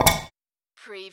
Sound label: Button Sound - Metal Tin Ding